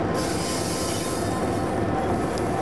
flange, class 66 train; OpenBVE

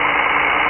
Motor, Train; OpenBVE; Bzmot

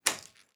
Key Out, Train; OpenBVE; Cl313 Silverlink

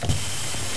Dry Wipe, Train; APT; OpenBVE

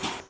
breaker, R62 Kawasaki, Train; OpenBVE

